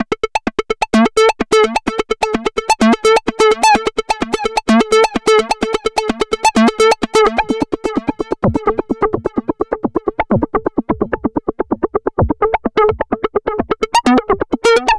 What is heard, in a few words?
Bubbling Arpeggiator, EDM; Sonic Foundry (Sony Creative Software); Afterhours EDM